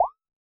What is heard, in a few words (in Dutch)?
Ploep